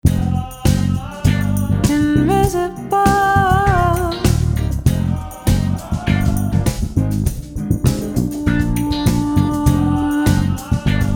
VOX MG

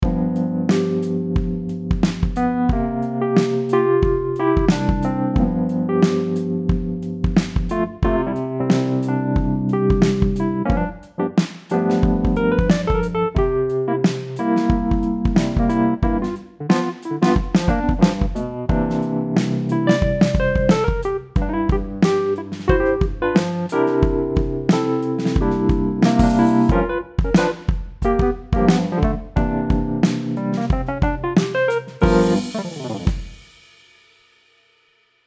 Wurly

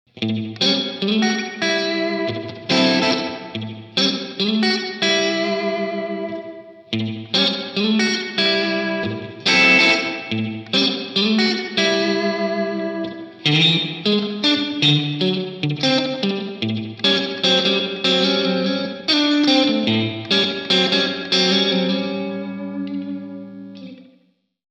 SPRING REVERB

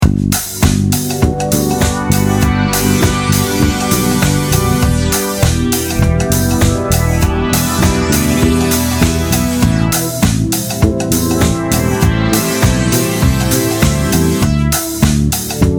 1625 G major, G–Emi–Ami–D7; Here’s the original; bass; chord substitutions

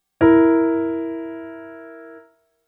C7 F7 Tritones

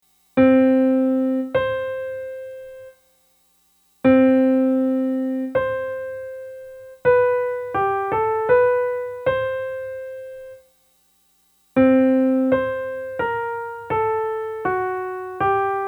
Octave P515

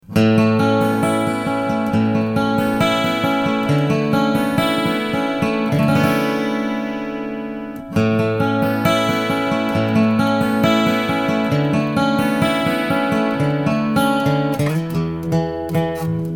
ACOUSTIC AUDIO CLIP FG TA FX

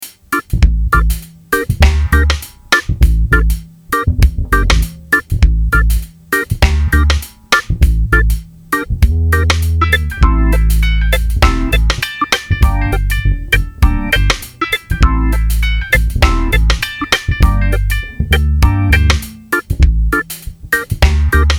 REGGAE

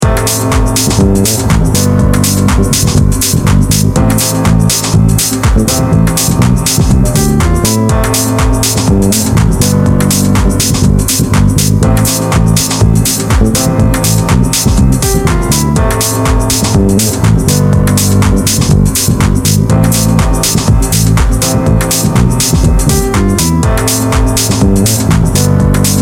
House, bass; groove

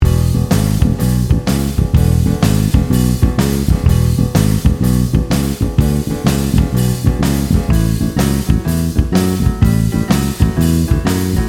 No turnaround

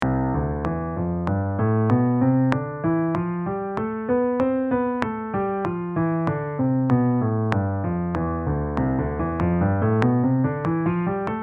Scales in rhythm with click

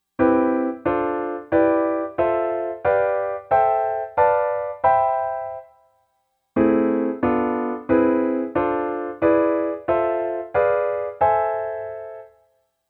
Scale tone 7th chords

